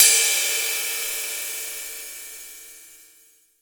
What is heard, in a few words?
22 SIZ, 22INCH ISTAN RIDE